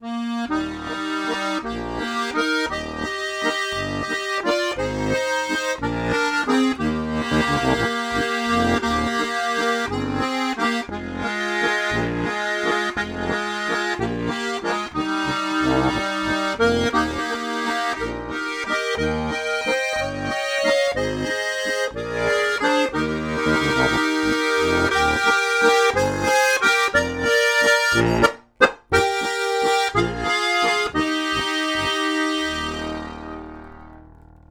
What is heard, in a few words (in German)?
BESASDES offen, harmonika